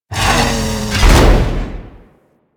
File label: Drone Close; Warframe; Venus; Venus Turrets; Venus Auto Agent Drone Turret